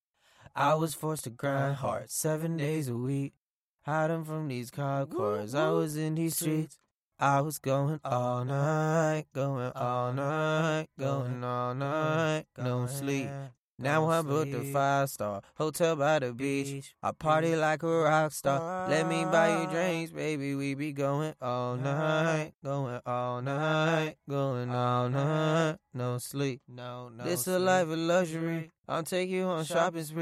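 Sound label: hip hop rap sing, 144, D flat minor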